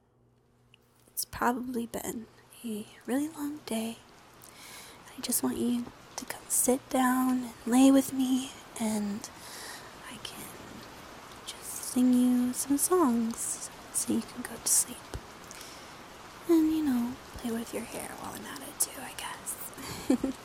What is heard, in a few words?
Let me sing you to sleep, rain sounds, soft singing, comfort